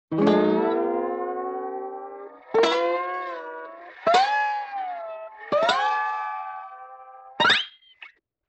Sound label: Steel Guitar Slide Ups; Vintage Cartoon; Musical Elements